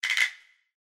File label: Allegro, notifications